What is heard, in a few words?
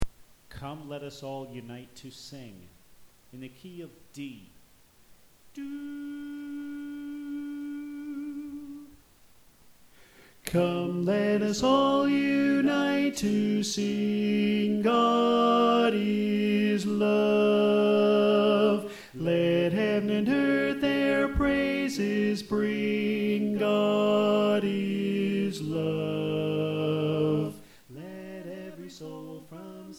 Tenor